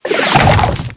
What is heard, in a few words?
big skeleton summon